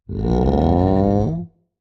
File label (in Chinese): 待机声; 嗅探兽